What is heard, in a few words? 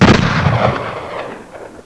banshee